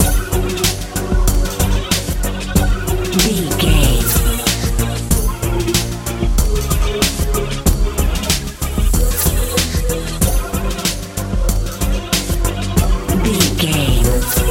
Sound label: pop dance